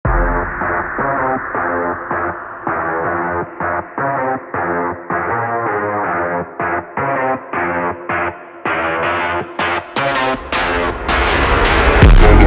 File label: slowed